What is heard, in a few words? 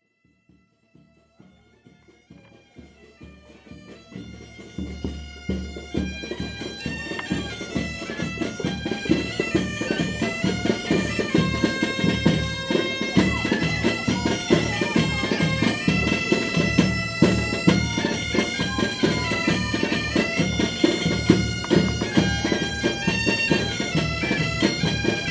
Galician Music Muros